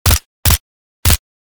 Special forces submachine gun two three round bursts